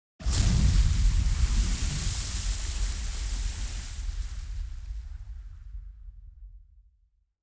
explosion material water; War Thunder

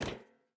footstep barefoot metal run; Rust

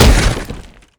rip stone

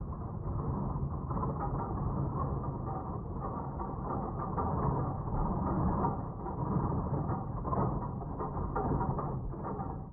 damage vehicle; World Of Warships

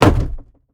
large wood box deploy; Rust

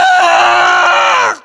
death, voiceovers; english

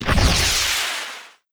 helicopter rocket fire; Rust

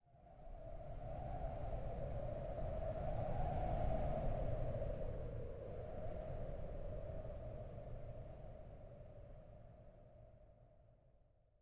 wind gust; Rust

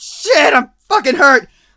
hurt heavy; Escape from Tarkov

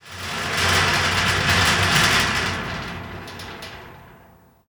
Amb Constructions; Red Orchestra Ostfront 41-45

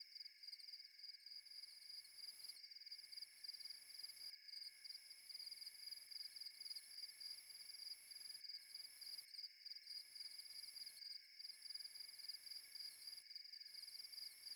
ambience crickets; Rust

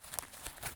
medkit draw; item salewa; Escape from Tarkov

